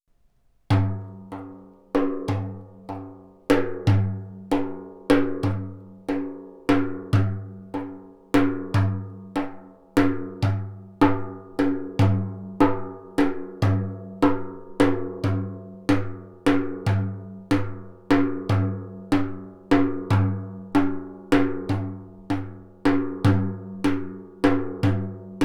normal dum tek tek, bendir, normal Room, percussion